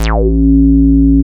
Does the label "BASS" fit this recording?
Yes